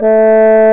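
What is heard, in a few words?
brass, Trombone; Roland L-CDX-03 Disk 2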